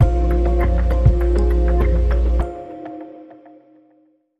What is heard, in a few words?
Bumper